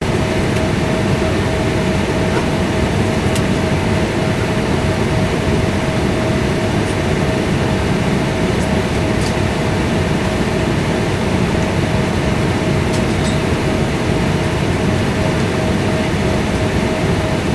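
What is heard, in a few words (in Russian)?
самолет Airbus A340 jet звук из салона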